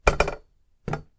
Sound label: chair on wall, 折畳椅子を立掛; 折畳椅子を立掛２